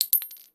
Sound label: casing